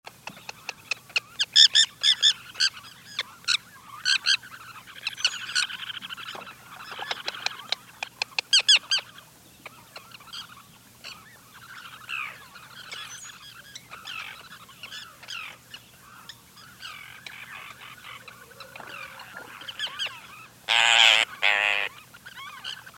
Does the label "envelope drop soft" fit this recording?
No